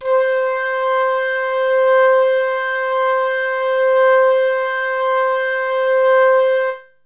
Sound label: flute c3; csound-manual-react